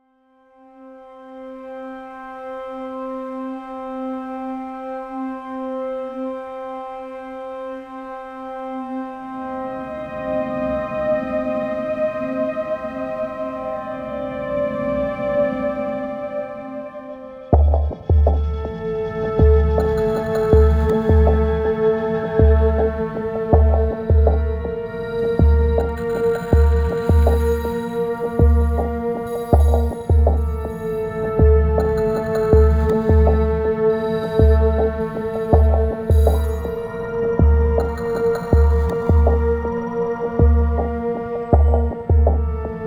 A Swirling Web Underscore, In Suspense

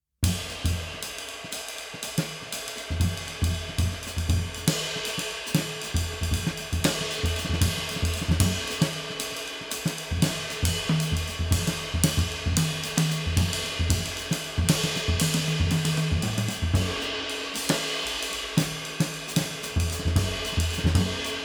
Sneaking Suspicion, Solo Drums; Narrative Mind

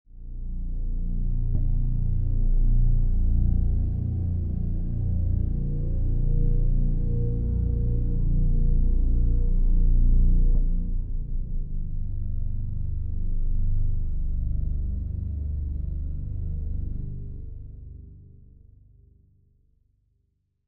Underscore, Piano Noir

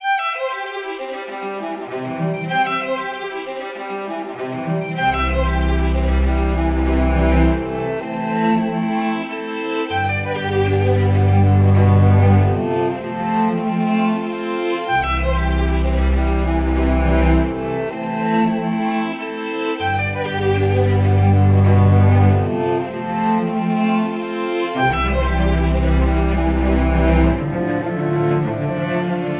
Autumn Strings; Narrative Mind

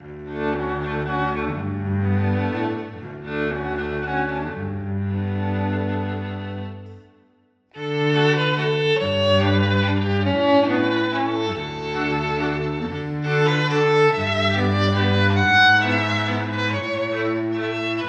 Muddlecombe Manor Underscore, Classical Cinematic; Narrative Mind

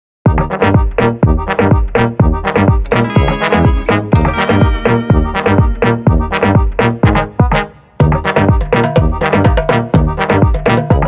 Barrel Dance Underscore, Balkan Brass

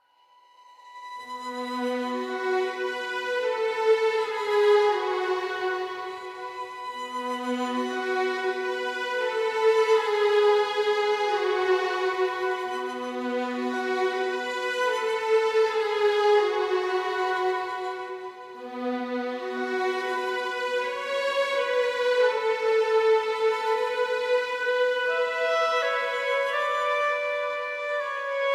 Leaves In The Wind Underscore, Autumn Strings; Narrative Mind

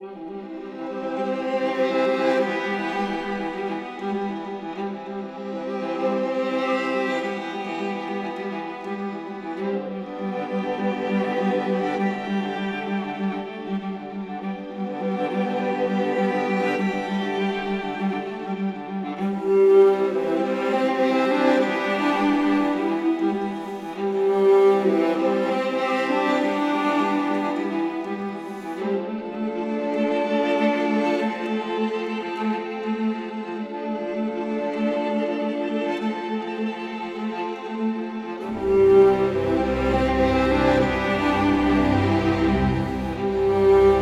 Downstream Underscore, Autumn Strings

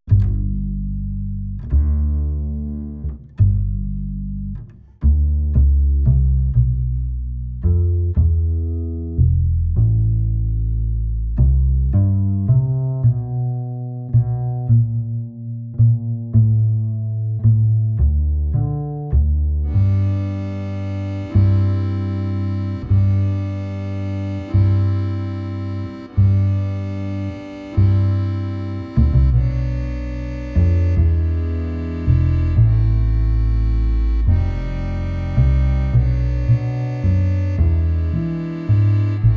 Soft Love Underscore, french lounge jazz; loving french lounge jazz; Narrative Mind

